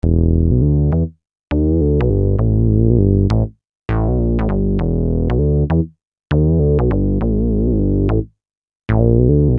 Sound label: Syn Bass 100 E, Techno Synth Loops; Sonic Foundry (Sony Creative Software); Signals I